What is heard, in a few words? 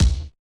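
Bass Drum Hard; Yamaha DJX-2B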